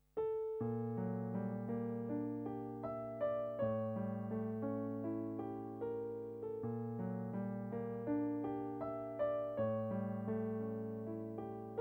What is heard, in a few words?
Piano only